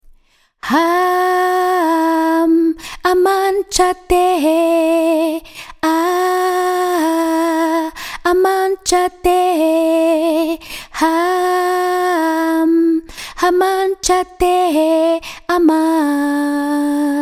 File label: Vocals Urdu